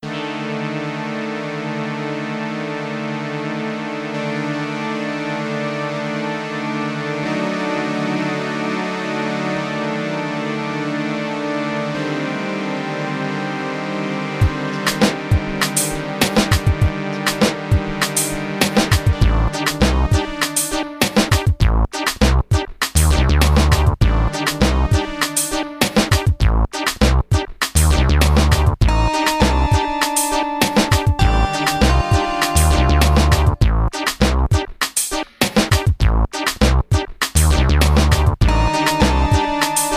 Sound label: trident; Les Indes